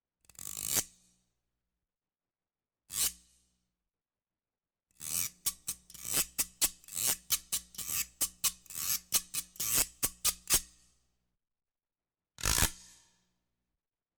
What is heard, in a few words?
Scrapes Percussion Accent ONE Scrape; Electa Quinney